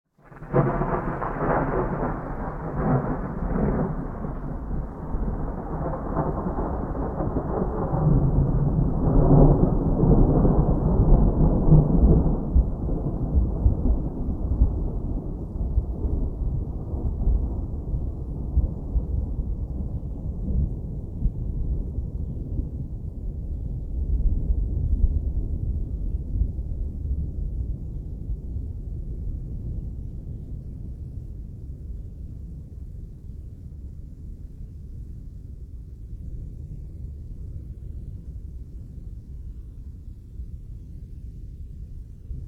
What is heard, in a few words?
Thunder long peel; boom library; Thunder And Rain